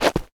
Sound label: Footstep Dirt; Smashy Road Wanted 2 android ios switch gamerip 2020